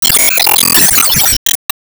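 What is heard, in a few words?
Sound Effect Special Fistball Last Hit; Hades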